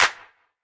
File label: hand clap sound c key, clap one shot tuned to the C note. Loudest frequency: 3307Hz; percussion; single hits one shots